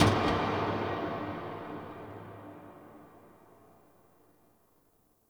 Creepy Metal Swell Clank

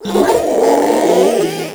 Strike Scream; Warframe; Proto Infested; Ancient; Abilities

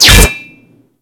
Bolt Impact Metal; Warframe; Cross Bolt

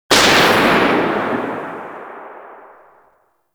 Shotgun Tail; Weapons; Warframe; Tenno; Revenant Shotgun

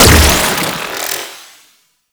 Droid Array Spin, Weapons; Warframe; Cephalon

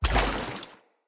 Step Water, Footsteps

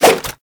nunchaku end whoosh; Warframe; Tenno

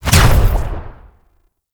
Fire; Grimoire

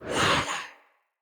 Staff Caster Cast Short; Weapons; Staves; Wazzard Staff Caster

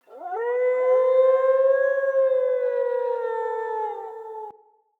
Wolf Cub Trapped Call Distant; Vocals; Animals

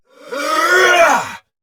Attack Effort; Warframe; Dax; Dax Ashigaru; Dialog Barks Battle

